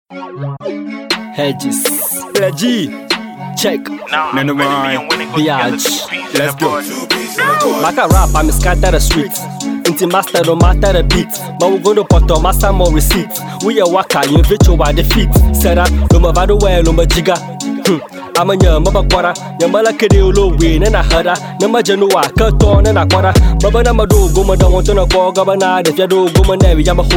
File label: Street Rap